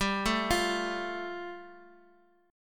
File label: G 7th Suspended 2nd G; x,7,5,8; down; 4G,5C,5E,5A